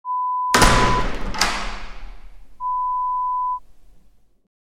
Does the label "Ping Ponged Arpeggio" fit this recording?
No